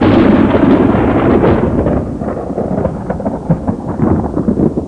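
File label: THUNDER; mtm2demo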